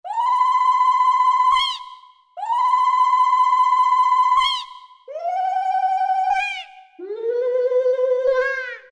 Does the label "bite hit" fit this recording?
No